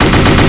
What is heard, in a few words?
Assault Rifle Shot Loop; SFX Kit; Weapons